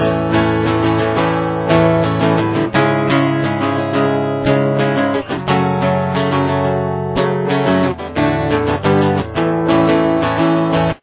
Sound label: full verse